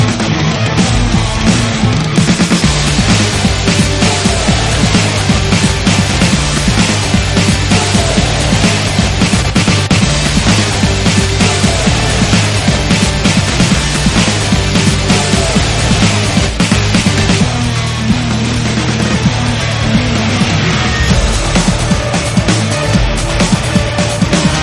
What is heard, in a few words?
instrumental; metal gear rising revengeance ost